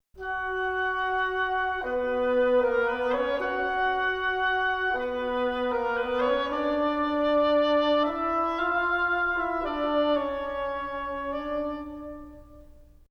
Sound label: bassoon oboe